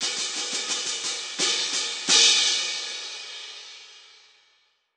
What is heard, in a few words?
Crash Cymbals Sound